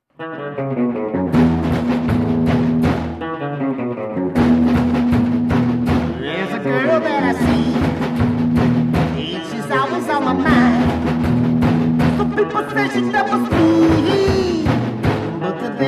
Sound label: ding dongs